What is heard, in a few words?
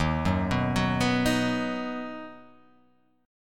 D sharp Minor Sharp 5th D sharp down